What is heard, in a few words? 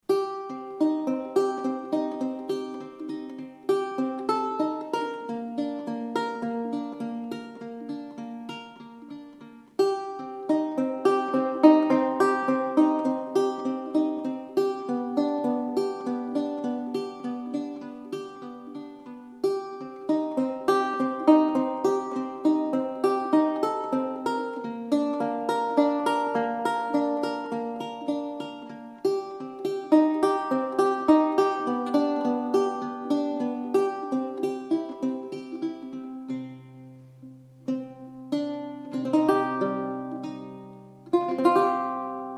passemezzo; zeugma